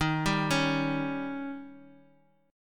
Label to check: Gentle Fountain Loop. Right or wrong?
Wrong